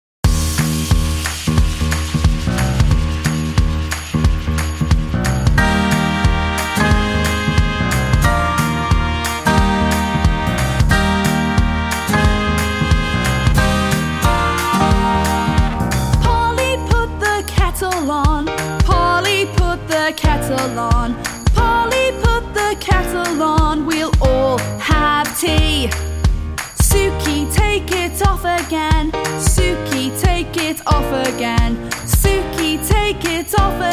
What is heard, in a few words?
sing a long songs